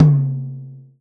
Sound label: Tom High; Forzee